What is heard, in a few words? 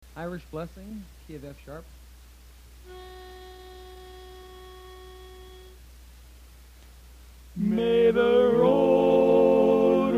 Irish Blessing, chorus